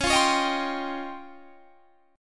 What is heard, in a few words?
C sharp Major Sharp 11th; C#M#11; strummed